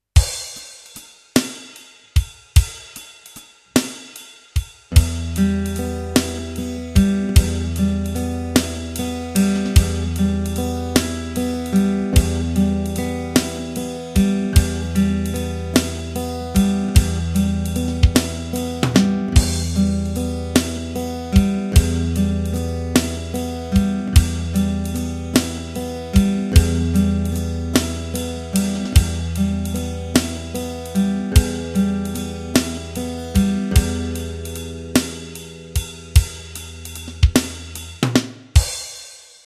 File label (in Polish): pasaz 6/8 e-moll